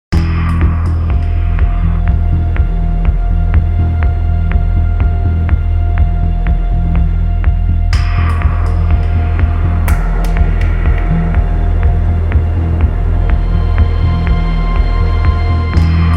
Suspense Minimalist; Jingles medley

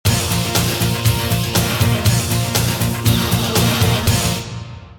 Action link; Jingles medley

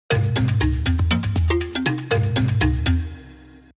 Jungle Link; Jingles medley

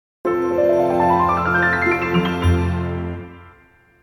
Fantastic link, jingle; Jingles medley

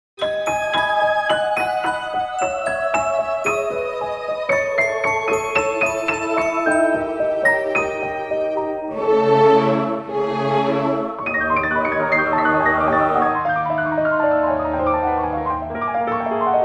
Fantastic jingle; Jingles medley

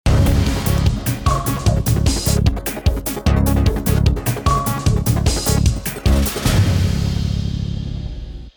Agent secret link; Jingles medley

